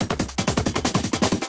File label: Beat, Industrial